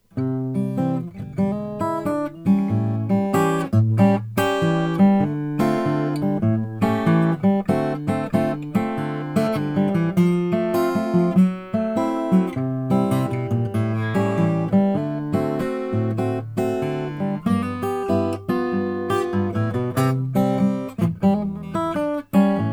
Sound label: om indian rosewood; alberico